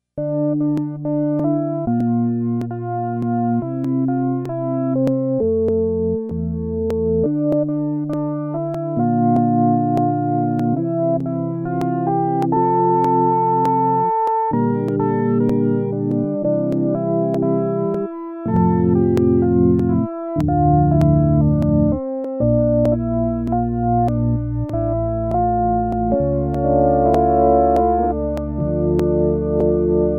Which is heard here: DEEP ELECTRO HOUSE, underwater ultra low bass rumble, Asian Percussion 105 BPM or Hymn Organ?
Hymn Organ